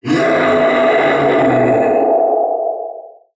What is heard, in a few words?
Death